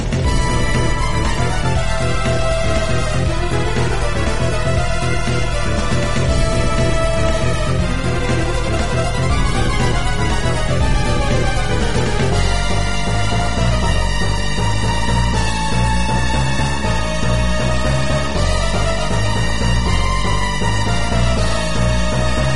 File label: Battle Boss